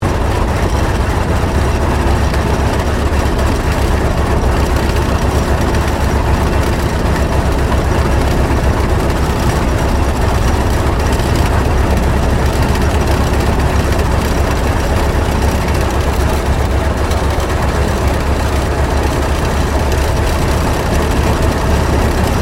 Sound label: AIRCRAFT T-28D TROJAN IDLE INTERIOR; Airplanes; Vehicles